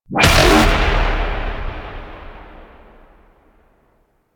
Anchors Away Cast; DE; Warframe; Weapons; Archwing; Powers; Archwing Healer

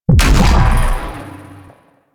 Briefcase Launcher Explosion; Warframe; Weapons; Corpus

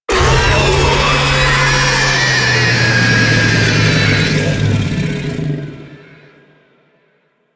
Infested Boil Destroy Scream; Warframe; Ambience; Eidolon Plains

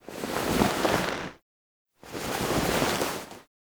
Foley Arm Turn; Operator; Warframe; The New War; Throne Room

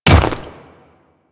Ice Step, Footsteps; Royal Guard

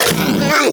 Predasite Vocal Pain; Warframe; Hunting Critters

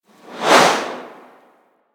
Grenade Projectile By; Grineer

